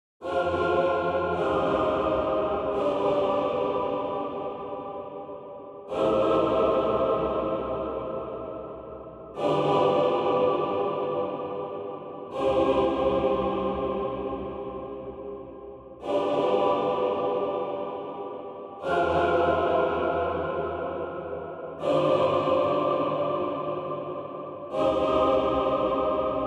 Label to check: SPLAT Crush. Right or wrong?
Wrong